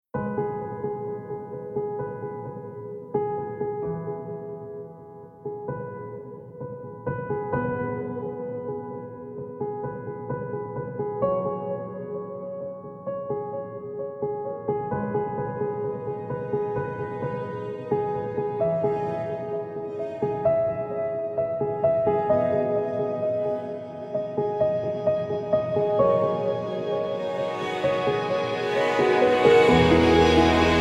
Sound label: instrumental